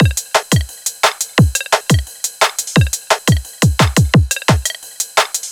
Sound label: Drum Loops, 174; M8; Electronisounds